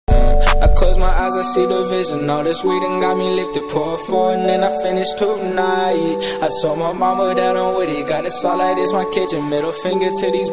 english song

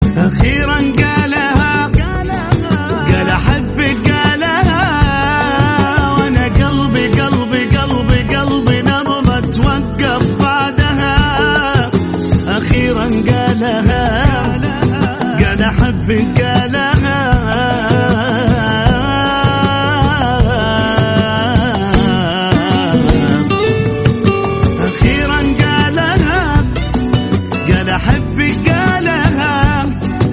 islamic song

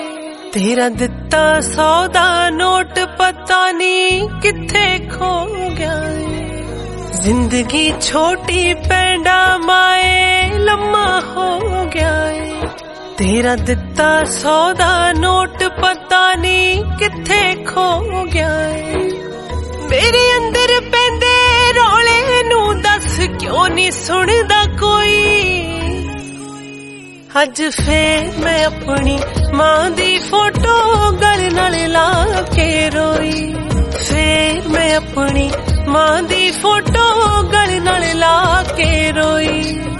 punjabi

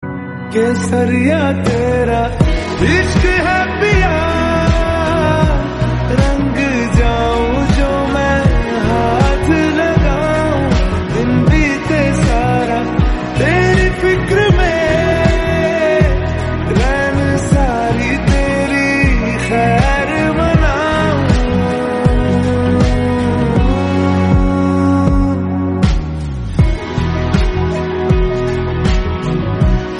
hindi; brahmastra

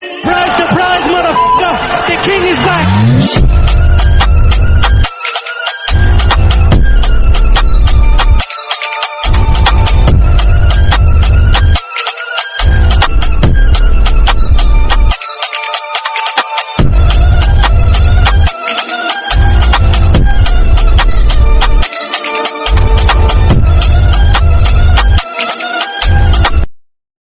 trap lion remix bgm attitude

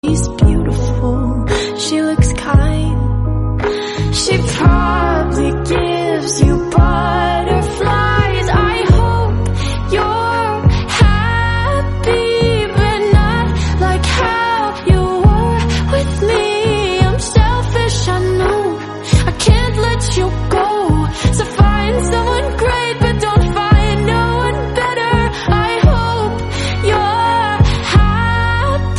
english song

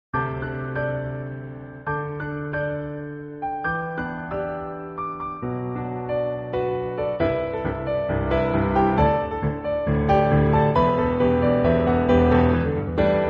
guitar instrumental

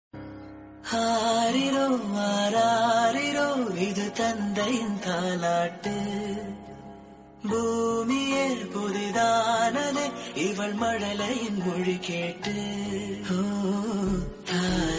happy birthday appa daughter and dad

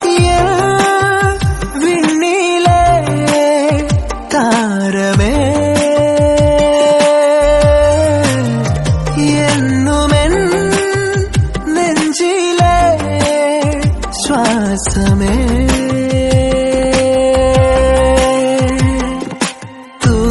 song malayalam

